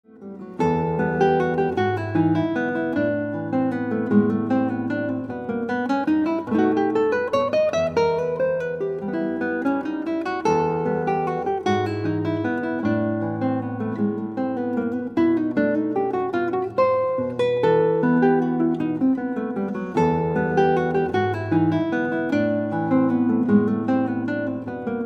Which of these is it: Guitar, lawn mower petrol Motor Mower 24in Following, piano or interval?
Guitar